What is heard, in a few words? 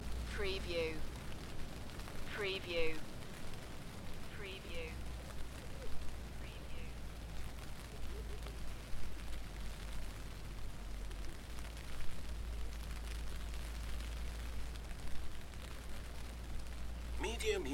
Light rain; beanstalk audio